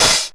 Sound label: MR16 HH OPN; Back In Time Records; Korg Universe VOL-1; DRUM FAMILY